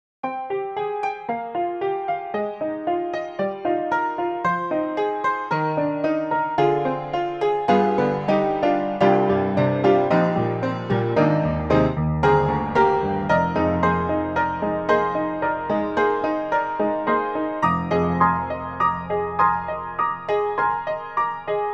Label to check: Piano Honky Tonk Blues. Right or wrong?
Right